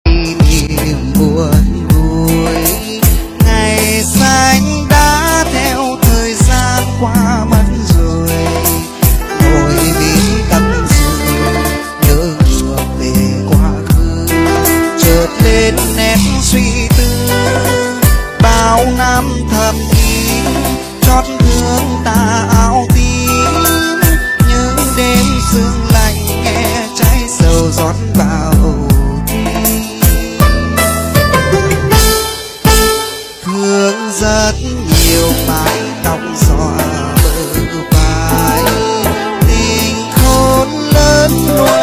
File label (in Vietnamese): Nhạc Sống Nhạc Vàng Trữ Tình Bolero; Thanh Hóa